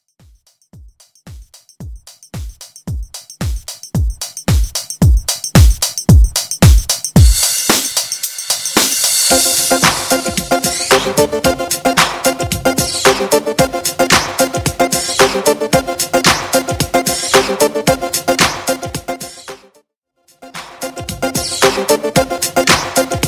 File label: 90s Redrum